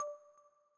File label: UI Victory Points; Beast Quest 2018; ps4 gamerip